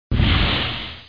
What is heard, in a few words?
POOFS; T.J. Finds a Friend